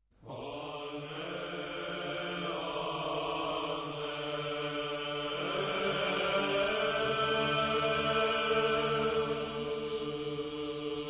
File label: musik